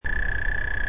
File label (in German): maulwurfsgrille; heuschrecken